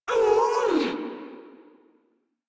Death Grineer Female, Barks, Battle; Warframe

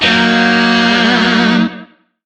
Shawzin Yo Chord C; Music; DE; Warframe; Lizzie Shawzin

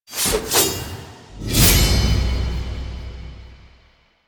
Sword Swing, Forest Night End; Umbra; Warframe; Sacrifice

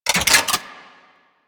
Archwing Mach Gun Un Equip, Weapons; Warframe

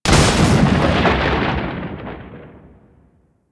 Lightning Impact; Enemies; Abilities; Warframe; Teralyst; Rainalyst

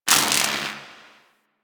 Gas Robot Repair Spark; Ambience; Props; Warframe; Corpus Gas City

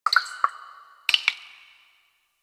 Ocean Interior Drips; Ambience; Warframe; Grineer Ocean

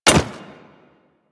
Hyena Micro Step Default, Footsteps; Warframe

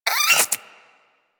Vocal Attack Short, Hunting Critters

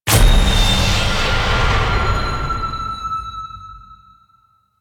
Resource Recovered; Warframe; Abyss Of Dagath

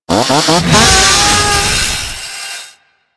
Ghoul Sawman Charge Attack Start; Warframe; Grineer Ghouls

